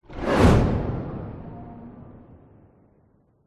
sound design cinematic whoosh growl into whoosh harmonic tail; zapsplat